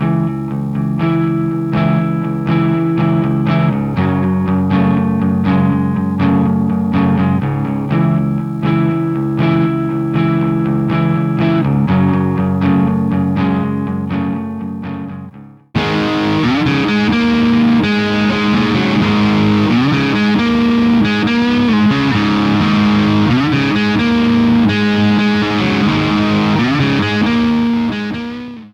spider II crunch

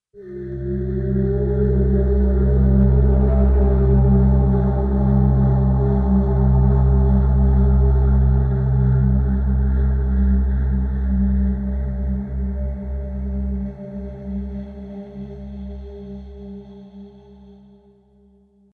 soundscapes hidden forest

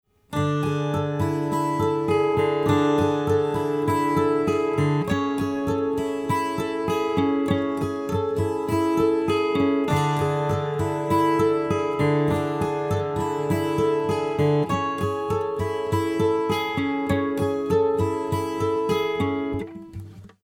12 String dry

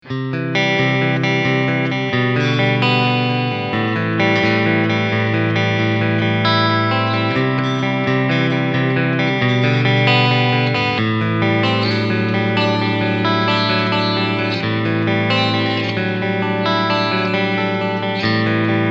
ibanez js140 wh

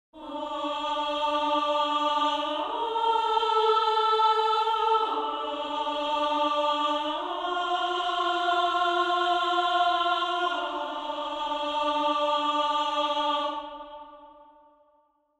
Poly True Legato; Strezov Sampling Freyja

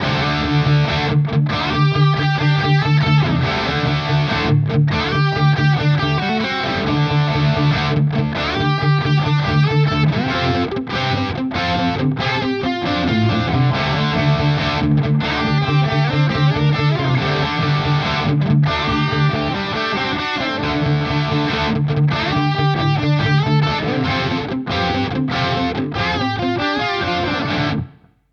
Epiphone Prophecy Les Paul Zerre Bridge Vintage

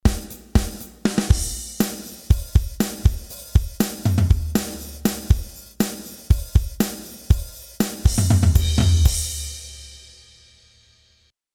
Loop DYN Loop Kick Punch; Samplitude Pro X6

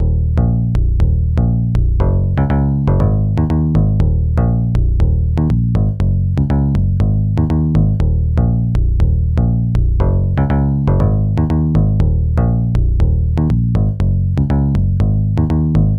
Bass Dexed

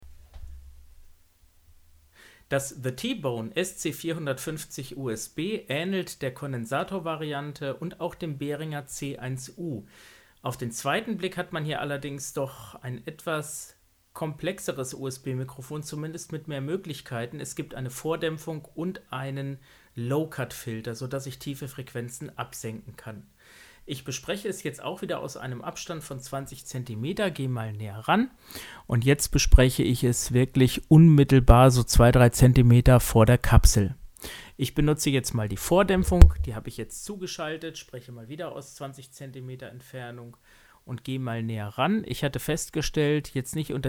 the t.bone SC 450 USB

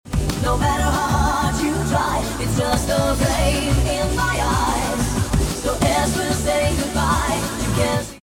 Tempo 130

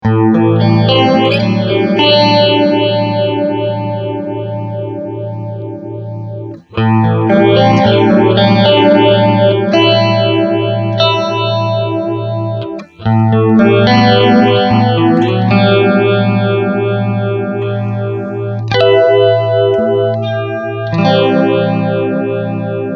phaser

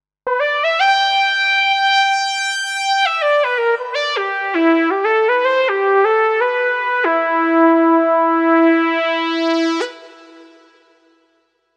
Kurzweil SP6 Lead Synth

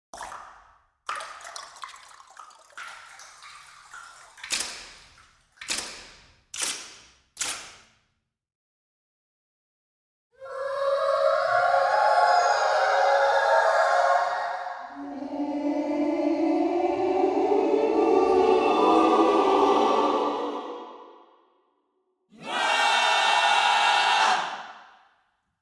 Choral Effects

